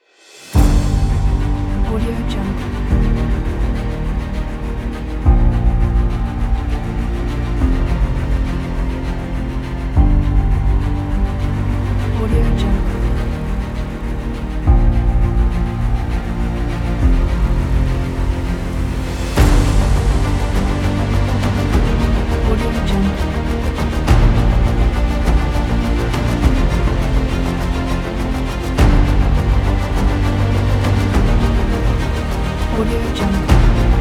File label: epic